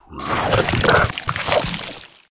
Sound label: Dragon Egg Enter Play